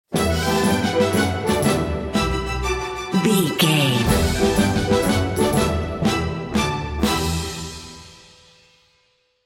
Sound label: sailing seven seas stinger